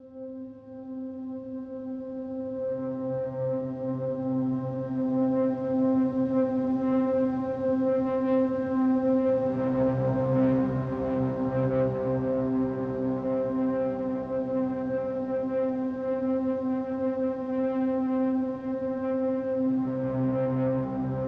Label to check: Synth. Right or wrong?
Right